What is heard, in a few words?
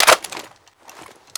aa12 reload clipout